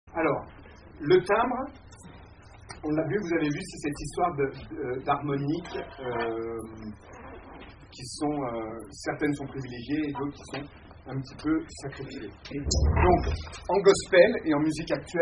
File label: Timbre, TECHNIQUE VOCALE